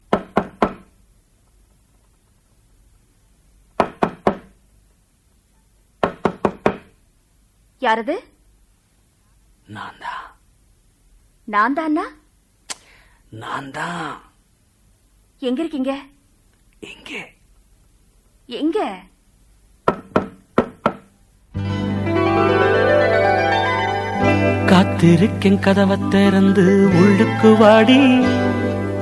Door Knock; Rajni

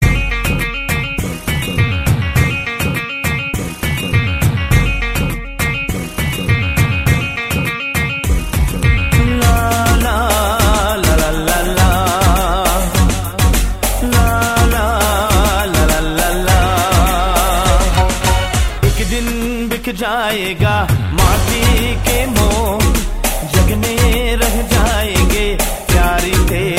Hindi Dance Mix

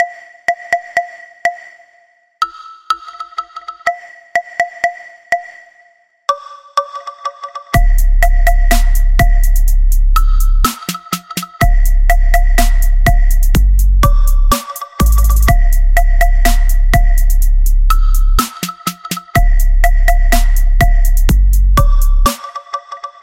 Percussion Music

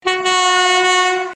Ship

